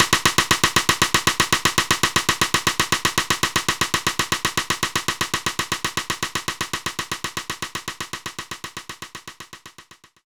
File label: Rim Shot